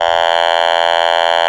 Otamatone

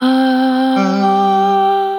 Chromatic Voices; Basic Voices; Ableton; Factory Packs; Voice Box

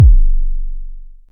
analog attacked, Kickbank

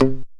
Mute Gtr; Ableton; Factory Packs; Retro Synths; Vector; Components; Vector Attacks

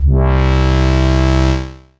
Big Burl, Analog Bass; Ableton; Factory Packs; Retro Synths; Analog Bass Vol 2

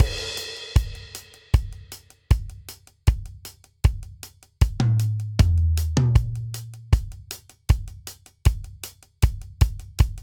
Straight Reggae Buildup 78 bpm; 4 4; MIDI Clips; Ableton; Factory Packs; Drum Booth

